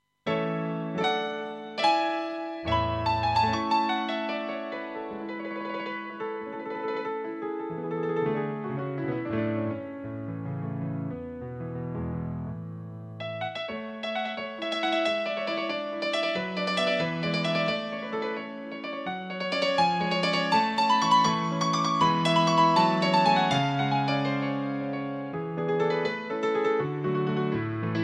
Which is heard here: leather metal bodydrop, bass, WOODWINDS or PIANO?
PIANO